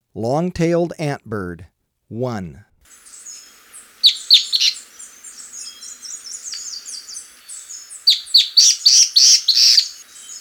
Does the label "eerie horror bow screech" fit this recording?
No